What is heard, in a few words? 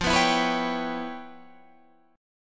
E Minor 6th F, Em6/F chord; strummed